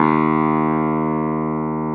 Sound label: CLAV D#2, CLAV PIANET; Sonic Reality; Vintage Timetraveler